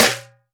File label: clicky steel snare drum sample a sharp key